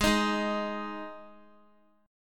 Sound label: Ab 5th down Guitar; strummed; JGuitar